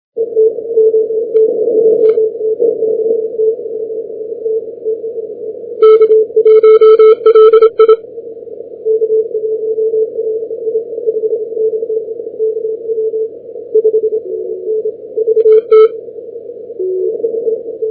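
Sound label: 80m short path; OT1T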